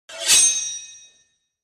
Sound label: Draw sword from scabbard with reverb